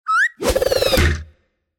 multjashnyj zvuk metanija; Звук ножа; Звук метания ножа

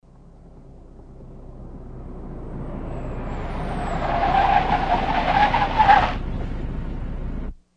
ne rezkij vizg

